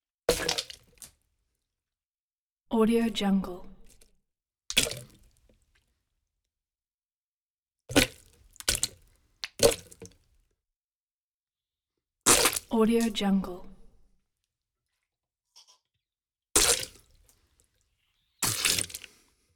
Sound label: efx int spit into bucket loud